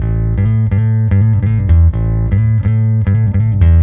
EBass Neck Pick 125 A2; musicradar; bass boutique

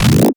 Scratching FX; Vengeance - Essential Clubsounds Vol.4; Effects

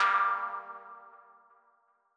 Mono DBeat G3; KG MegaSaur

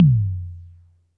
Toms, Elektron MachineDrum; SPS1 MKII

